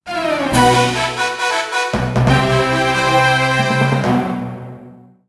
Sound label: Enemy Encounter Fanfare; Dokapon Kingdom; Wii